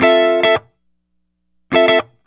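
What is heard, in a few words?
Jazz Guitar Chords, Dmin, 105 bpm; Fusion; Pro Tools Essential Loops; Digidesign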